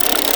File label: Hack Minigame Cursor Move; Warframe; Duviri